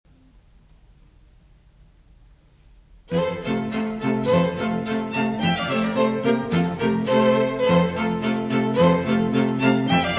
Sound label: quartet